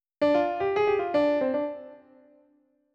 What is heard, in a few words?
Piano